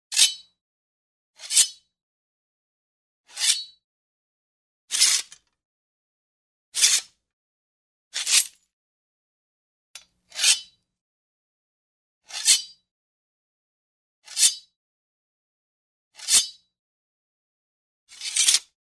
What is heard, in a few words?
The sword is taken out, sheathed; cold weapons